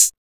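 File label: HIHAT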